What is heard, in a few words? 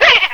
ghost Scared; HeavenStudioPlus; sneaky Spirits